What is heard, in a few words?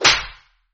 Slap